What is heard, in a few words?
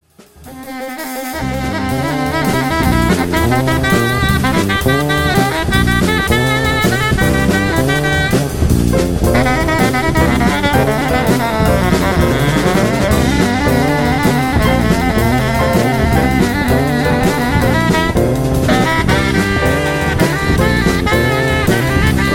Seawind